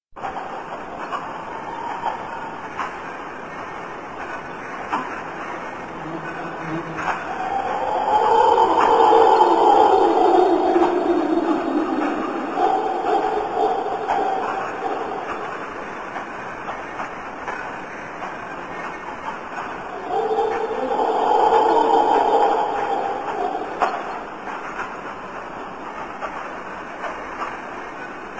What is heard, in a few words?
howler monkeys